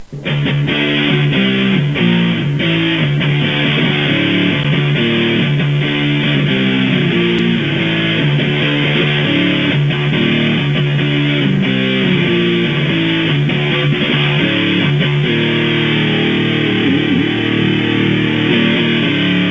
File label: guitar